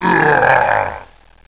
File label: pain, bloodZombie